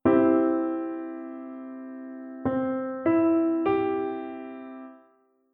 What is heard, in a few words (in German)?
Dreiklang Dur Grundstellung; Alle Dreiklänge bestehen in der Grundstellung immer aus zwei Terzen